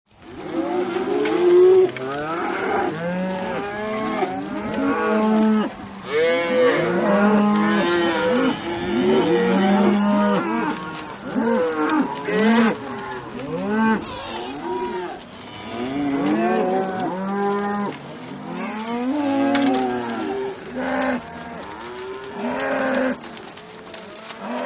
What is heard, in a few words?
Cows, Herd; Multiple Moos, Close Perspective, As They Pass. Distant Cowboy Yells.; cattle